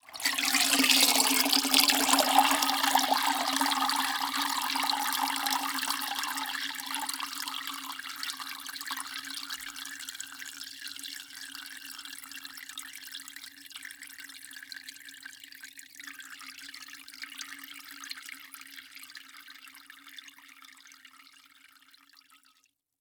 Liquid Water Mop Lifting Out Of Water Dripping Into Mop Bucket